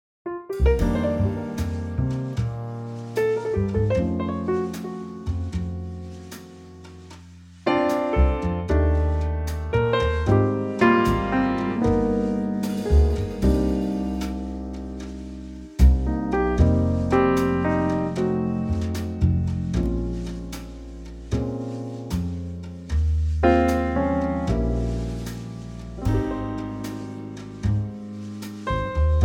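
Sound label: Trio Eb, backing track; key - Eb - vocal range - Db to G; New 2023 Trio arrangement of this wonderful classic standard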